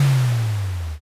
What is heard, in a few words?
dungeon door